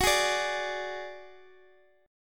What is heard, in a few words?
Gb Suspended 2nd Sharp 5th, Guitar Open G